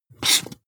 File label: marker mark hard short